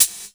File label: HIHAT; Crypton - DrumBase5000; METALIC DRM2